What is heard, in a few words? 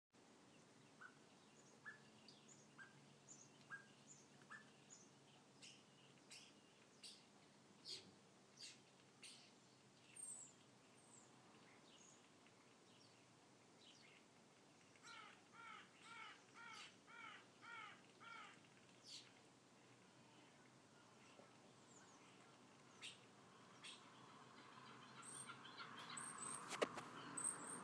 twenty different birds at my door